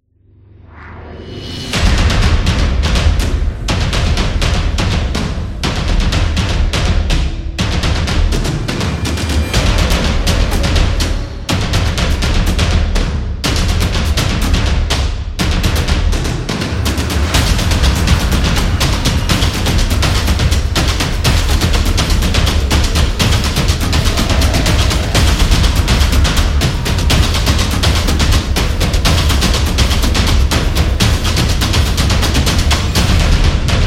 Intro Cinema, war drums

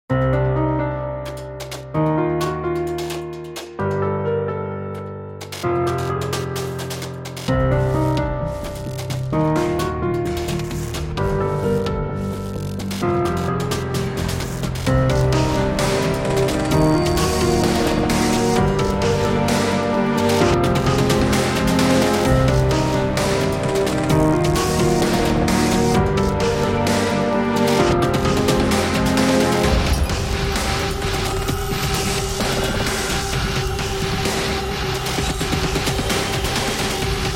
Dark Industrial Intro; fear

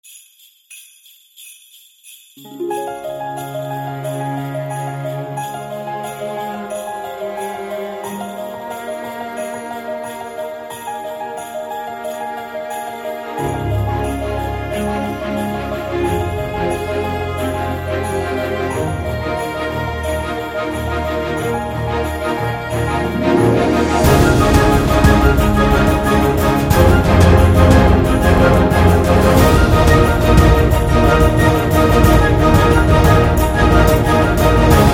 Christmas Gifts

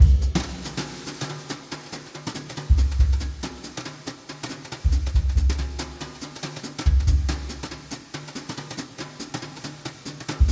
Swing Jazz Drums Solo; football drums

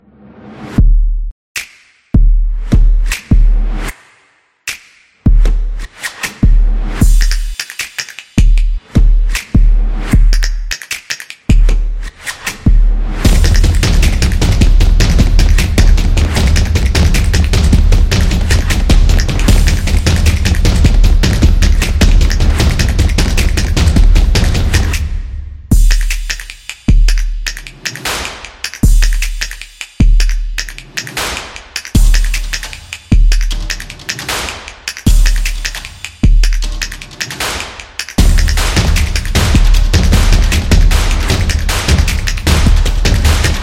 Product Background; stomps and claps

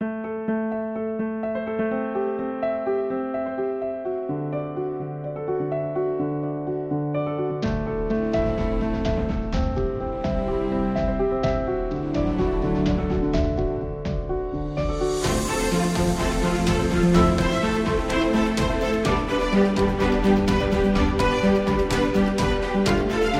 Motivation; epic music vol 3

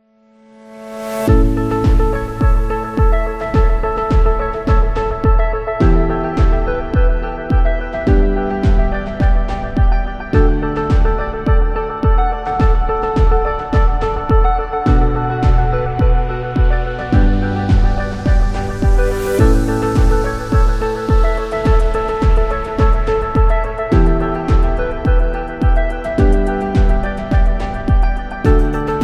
Technology Ambient; healthcare

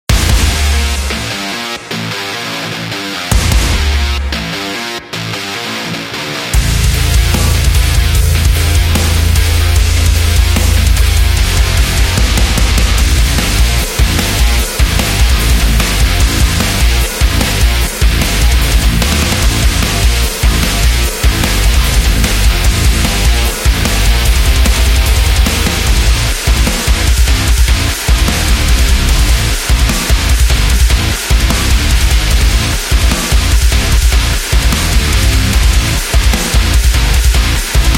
Metal Extreme

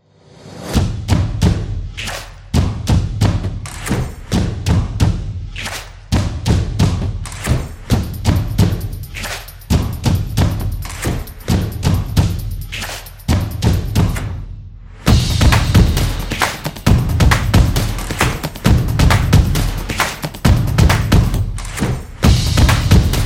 Drums Energy; soccer stadium

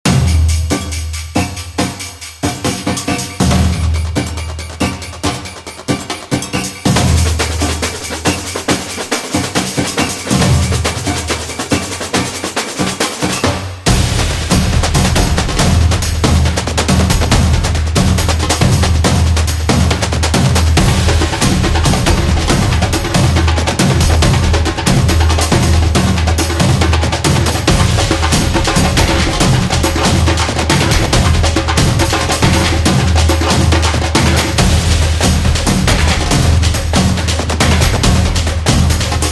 Samba; Brazil

